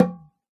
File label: oxaca, percussion; ILIO